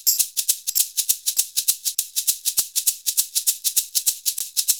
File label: SHAKER, Modern Percussion Loops; Soundscan vol.56; AKAI; SHAKER100